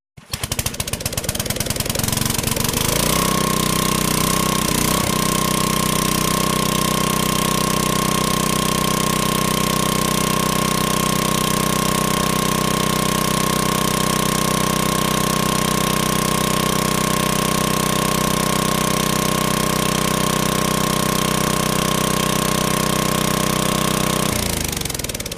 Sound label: SMALL GAS ENGINE; engines small